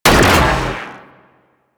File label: Shotgun Fire; Warframe; Weapons; Corpus